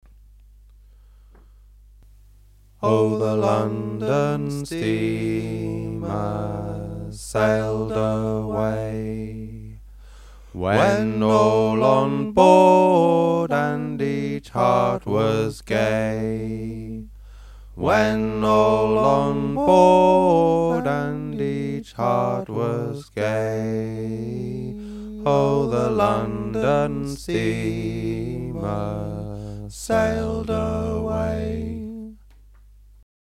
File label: London Steamer alto and tenor harmonies sung; singing histories